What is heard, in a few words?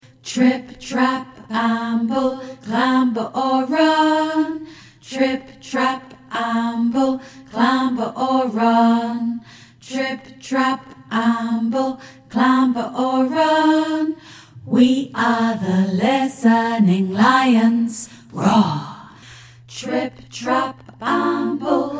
trip trap round harmony; the fitz